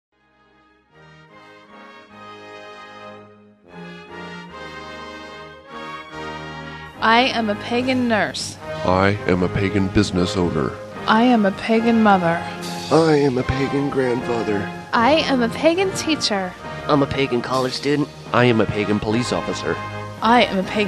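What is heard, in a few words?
anthem with female vocals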